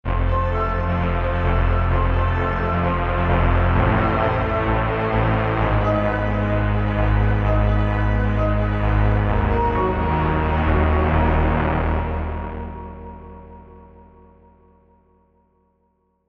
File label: Tape Arpeggiator Repeat x2; Heavyocity Mosaic